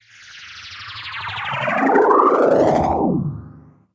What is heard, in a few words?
Lazers